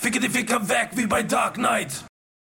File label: dark night